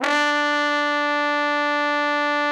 TROMBONE; Best Service

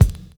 BD, BASS DRUM, 70's Breakbeats; USB Soundscan vol.46; AKAI

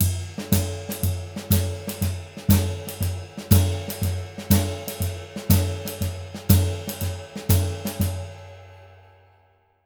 120 SHUFFL; Univers Sons; Jazzistic

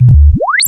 tape fx, scratch; Ambient Grooves

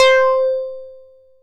MULTIBASS, Dance Mega Jungle Rave

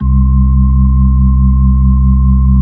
B-3, Keys of the 60s and 70s

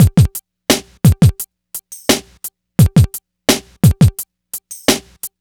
DRM, Hip Hop and R&B Drumloops; Best Service ProSamples vol.01; AKAI

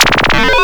noise 2, sound noise; NOISE+ALARM

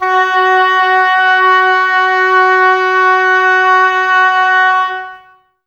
OBOES F#, Wood Winds; Miroslav Vitous; Woodwind Brass Ensemble

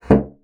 bassoon slap; Xsample VOL-6; C BASSOON

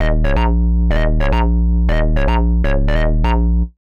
Hard Synth Bass Bb, 125; PowerFX - Nu Jazz House

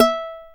UKULELE E4; AKAI S-Series CD-ROM Sound Library VOL-7

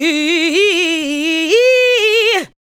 ADLIBS

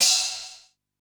Foley Hi

